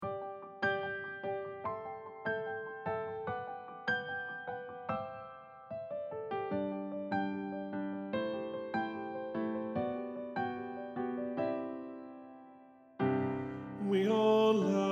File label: Bass